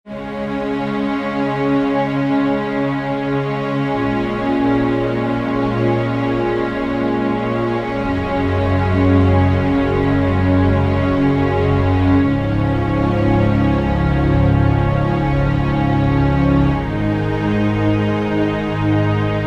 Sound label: Underscore PAD; Worship Flow